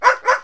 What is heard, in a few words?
cook bark